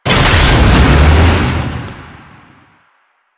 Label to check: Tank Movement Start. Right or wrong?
Right